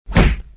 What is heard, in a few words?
punch, melee; weapons